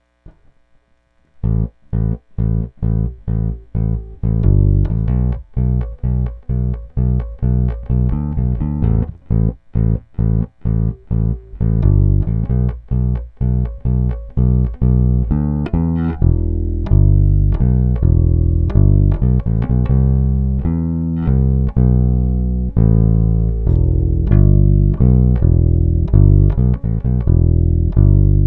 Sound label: bass